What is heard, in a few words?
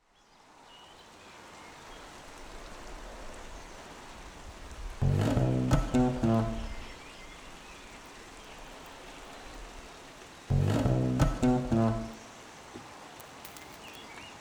song for a thunderstorm